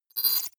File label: deny click; maschinen; signale; soundpool